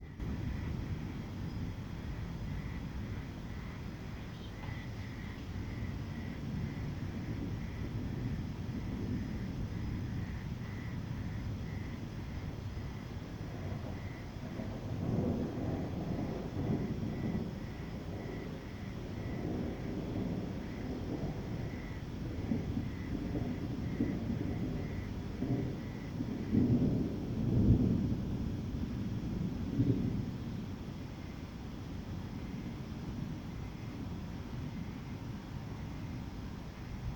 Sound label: Thunder in the Evening Douala Cameroon